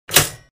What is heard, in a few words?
Toaster Pop Up, Kitchen